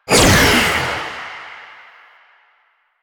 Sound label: Scythe Proj Whizz; Stalker Jade Scythe